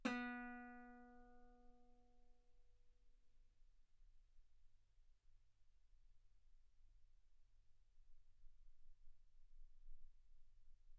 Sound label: Guitar ff sul B B3; single mic